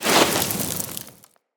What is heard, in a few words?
Sword Elemental Fire Whoosh; Teshin; Weapons